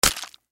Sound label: Impact Splat Wet Juicy Hit; Splats; Sound Effects:Objects; Blastwave